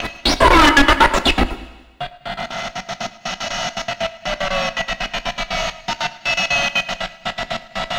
Envelope Beat; Drums & Drones

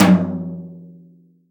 TOM, CTD TOM BRSH

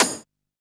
Hat, Drum & Bass

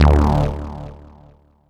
Synth Hit D

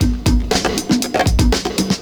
PERCS, 70 s Breakbeats; USB Soundscan vol.46; AKAI

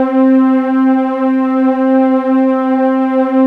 PWM STRING; CMP - Analogon

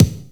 DR3 KICK; AKAI S6000 CD-ROM - Volume 4; Kick 2

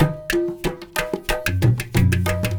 INDIA L, Heart of Asia, IND ENS; Spectrasonics - Heart of Asia CD2

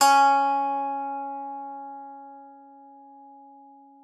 PSALTER C#4; Xsample VOL-8

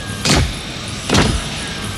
FACTORY SL, HYDRAULICS; Northstar; The Wizard VOL-1

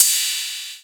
Ride Cymbal; Best Service; House Definition VOL-1; Cymbal Stuff

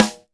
AMB SN; AKAI S-Series CD-ROM Sound Library VOL-1

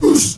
MALE WAR, Heart of Africa, shout; Spectrasonics; Heart of Africa 1; CH. SHOUT2

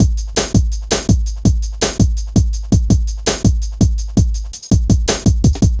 Ezee Rolla, Drum Loops

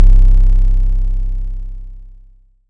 C3, Synth; 90 s Sample CDs; NiceBeats; SynthLine VOL-21

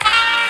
Trumpet Snip; Proteus 2000; Digital Sound Factory Proteus 2000

